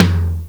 LOTOM, Korg ddd5 drums

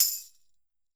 Roland MV8800 percussion

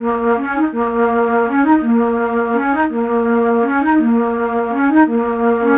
Flute, Cinematic Funk; Sonic Foundry (Sony Creative Software); Cinematic Funk Urban Tone Poems

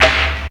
Snare, Drum&Bass; 90 s Sample CDs; Total Drum&Bass

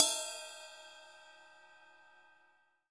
CYM Ride; Best Service Gigapack 2

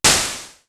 WITHE NOISE; Univers Sons; Basicussions

